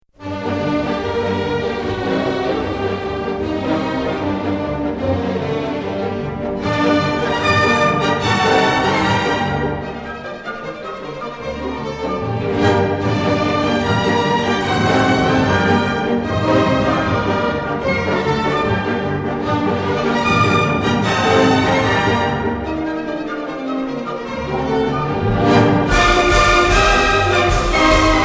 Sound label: Hungarian Dances No 5 ring